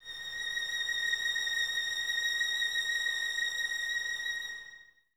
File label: violins A#6, String Ensembles, 23 Violins; Miroslav Vitous